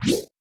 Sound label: Swiff Sword; Kirby; Super Smash Bros